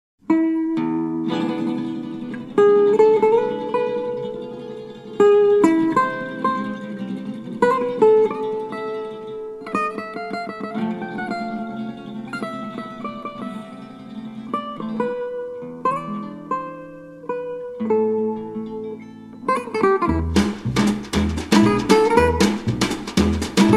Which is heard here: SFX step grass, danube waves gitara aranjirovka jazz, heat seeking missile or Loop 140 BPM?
danube waves gitara aranjirovka jazz